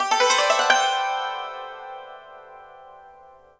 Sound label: YANG CHING, Heart of Asia; Spectrasonics